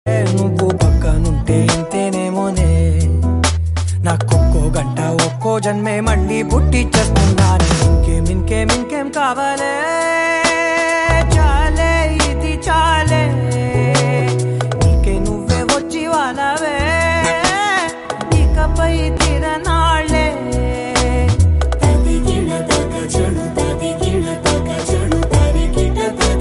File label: music